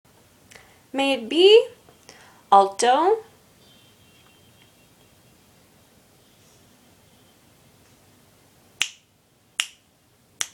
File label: Vocal Alto